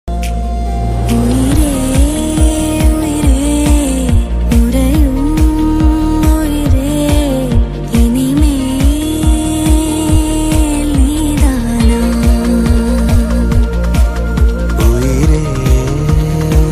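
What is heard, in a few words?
song ringtone ringtone background music; sai pallavi; amaran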